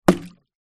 household watering can or container empty small plastic throw land into deep water